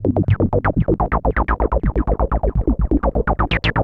ethno 125; eJay

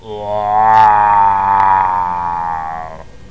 growl

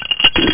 Money

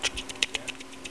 Mysterious clicking; Curse of Dragor

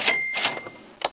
Diesel; Civ II; Vikings Civ2 Mac Mod

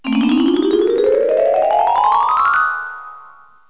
fast xylo, music

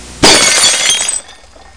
glas brk

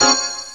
Bat; Racing Days R DEMO

